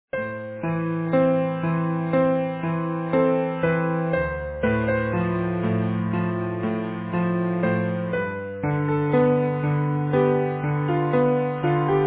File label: Easy Piano